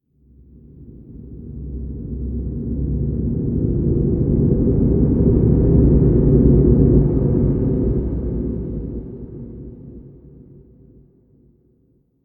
Rnd Abstract Amb

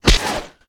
Fireworks Launch; moul-assets

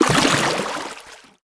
Deep Water Walk

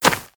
Grass Run; moul

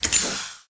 Pins Release

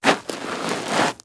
Dirt Turn; moul

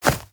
Grass Run; moul-assets

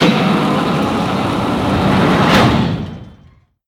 Ball Plunge; moul

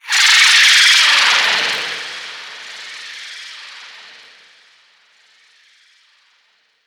Pain Scream Delay; Bahro; moul

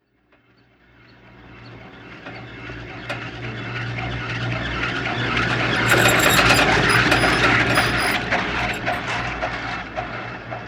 Cart Tower

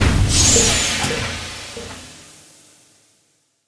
Harvester Stop

